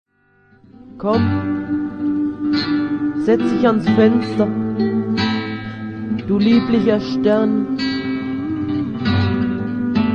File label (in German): schneefloeckchen gesungen; hook